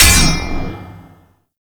Parry Sword; Enemies; Weapons; Warframe; Teshin

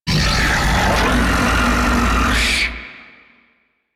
Octopede Attack; Warframe

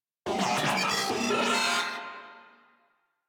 Vocal Enemy Spotted; Warframe; Moa

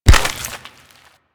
Infested Crawl; Warframe; Crawling

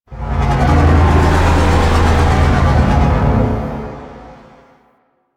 Void Dragon Vocal Pre Death; Enemies; Vocals; Warframe; Duviri

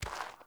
Walk Gravel, Footsteps; Characters; Enemy; Mockeries

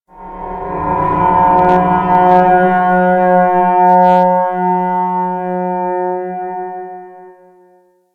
Creepy Screech; Warframe; Ambient

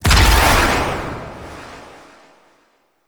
Blade Explosion; Enemies; Warframe; Warframes; Pax Duviricus

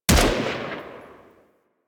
Braton Vandal Redux Fire Shot; Warframe; Weapons

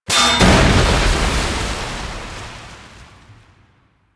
Huge Tank Explode; Effervon; Warframe; Ambience; Nineteen Ninety Nine

